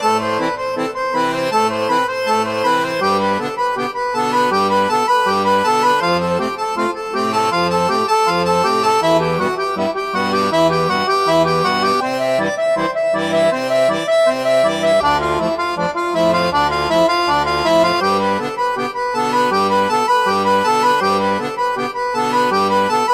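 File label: accordion; Аккордеон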